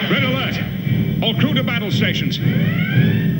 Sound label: red alert